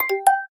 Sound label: Google Glass Notify